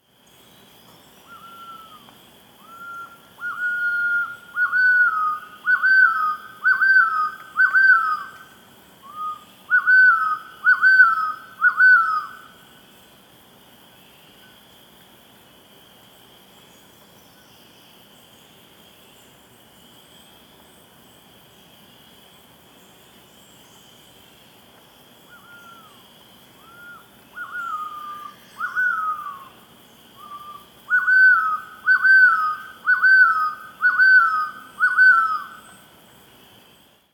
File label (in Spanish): Quetzal Cabecidorado Pharomachrus auriceps